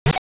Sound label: kick; GBA; MLSS